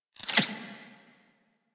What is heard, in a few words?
Reload, Weapons; Warframe; Zylok Prime